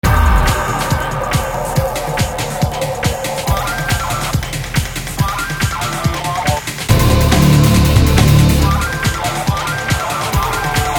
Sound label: techno